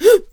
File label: Harvester Attack Vocals